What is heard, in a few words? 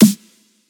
Punchy Snappy Reverb Snare